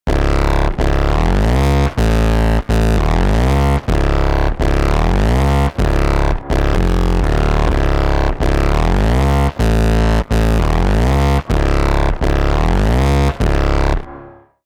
synth bass tube push pull; Tube saturation; Saturator X; Tape saturation. Transformer coloration.

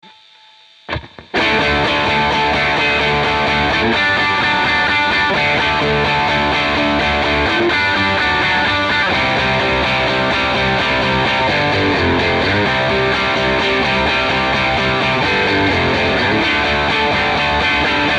X-DRIVE H-BOOST; xgear

